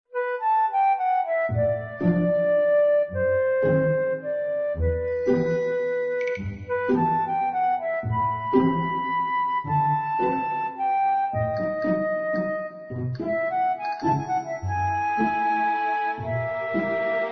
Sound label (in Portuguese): infantil orquestrada